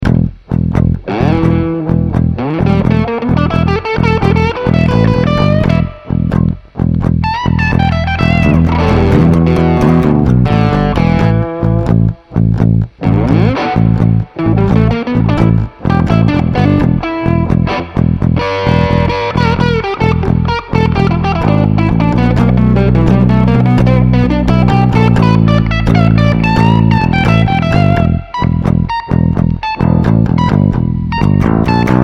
blues

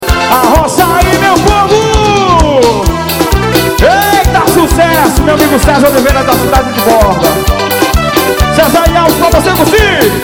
bolero

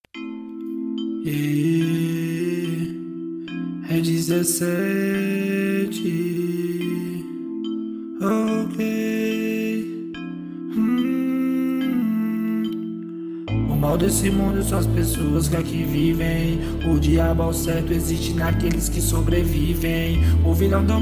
rap

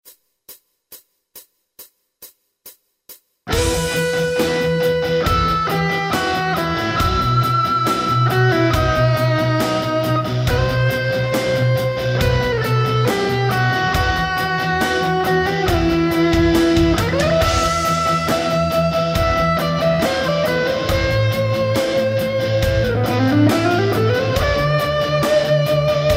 solo

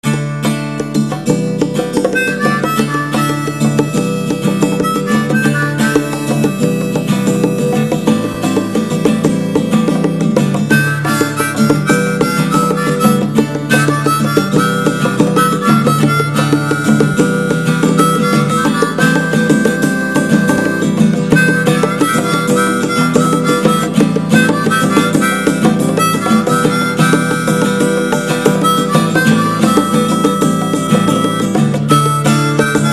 trumpet

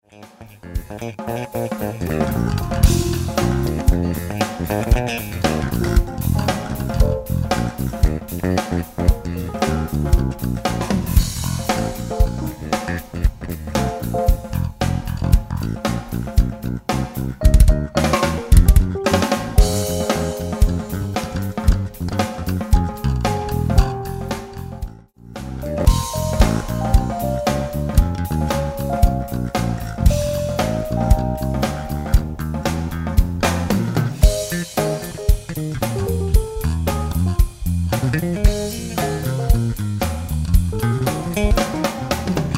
jam

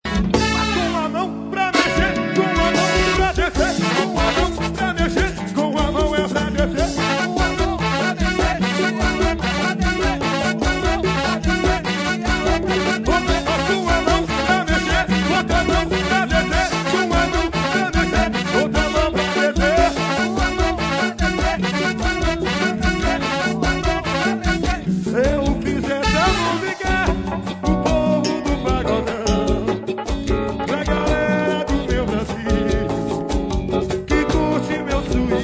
groove

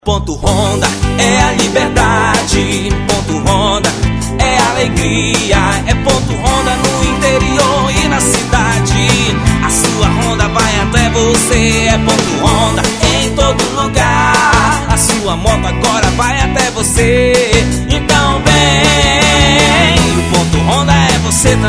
jingle; ponto honda; m7 produtora

